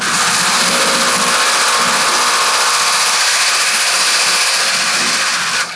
heavy electrocution sounds; Garry's Mod; omnidroid 16

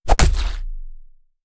Fleshy Wound; lin gang; adan mech

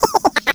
skelly small; Garry's Mod; tf2; halloween; skeletons

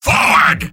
battle cry; vo; soldier; tf2; mvm

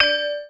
spelltick, halloween; Garry's Mod; tf2

